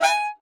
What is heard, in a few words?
Saxophone; Zomboid; Lifestyle